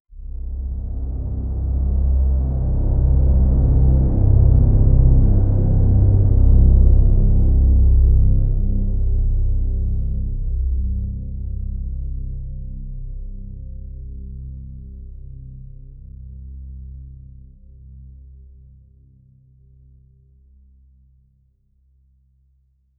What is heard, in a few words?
low ominous drone with su